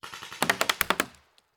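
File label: Paintball Gun Impact; Sound Ideas; Guns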